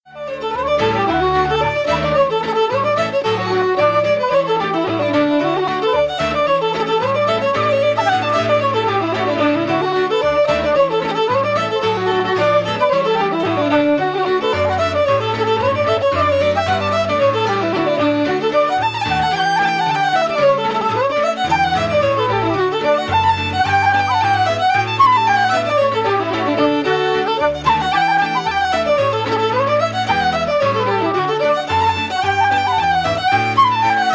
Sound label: fiddle and flute